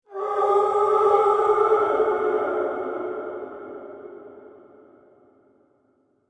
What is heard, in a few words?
Scream Horror Surreal Scary, Scream Surreal Scary Heavy Reverb Terror; Pitched Down; Screaming; Voice